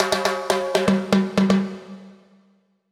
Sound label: Timbales